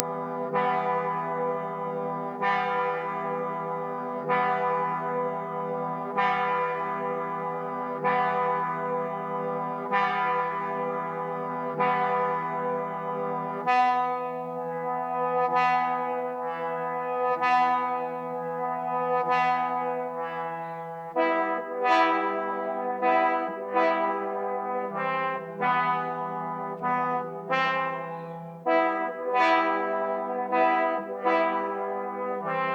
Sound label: brass riot; lyria